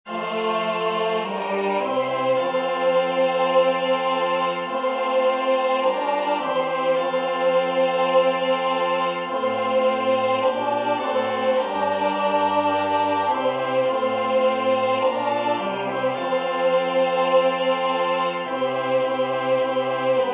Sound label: Sanctus tenor; singing divine service iii in parts